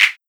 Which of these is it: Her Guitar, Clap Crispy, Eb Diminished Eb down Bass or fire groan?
Clap Crispy